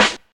sharp snare g sharp, Royality free snare single hit tuned to the G# note. Loudest frequency: 2202Hz